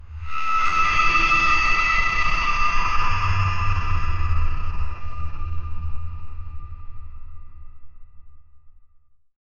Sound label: subterranean drone at 40